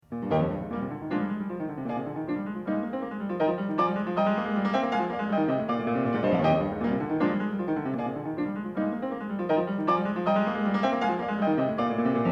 Piano; Variations & Fugue In E Flat, Op. 35, Eroica Variations - Var. 4

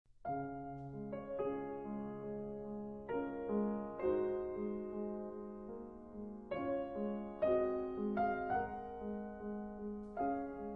Prelude in D Flat Major, Piano; Prelude No. 15; Raindrop

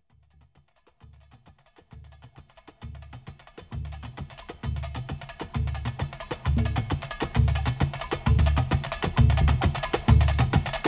Batucada

